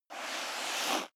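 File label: step high heels sand slide long, footsteps; schritte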